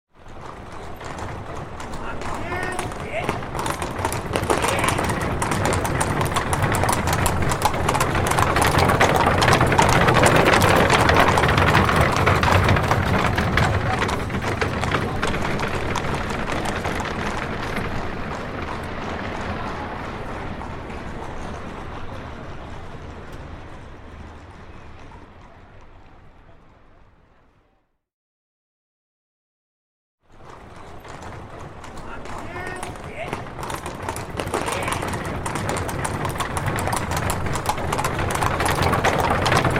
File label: Horse Drawn Carriage Medium; Horses Carriages and Traps; Hollywood Edge Historical Series